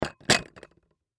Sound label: Bowls Stack Down, Bowls Stack Set Down Dishes; Household; Dishware